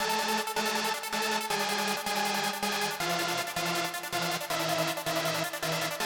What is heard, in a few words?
random dubstep synth; looperman; ranko75